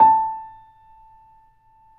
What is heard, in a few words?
Grand Piano PP A4; AKAI S6000 CD-ROM - Volume 2; Grand Piano 256M